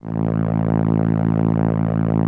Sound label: SOLINA, String Machines